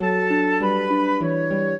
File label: trio, flute harp; Mozart